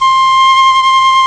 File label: flute awesome; Amiga Soundtracker Sample Packs